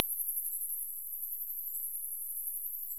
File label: Myotis macropus